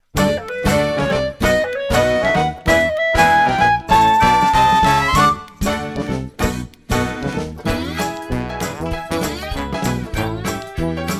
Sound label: Steamboatin' (Instrumental Only)